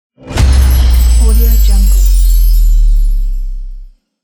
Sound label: christmas sub boom